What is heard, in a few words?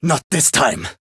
Voice damaged; claude